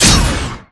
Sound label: Beam